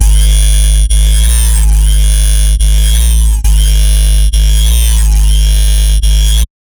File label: Bassline B2 140, Dubstep; GhostHack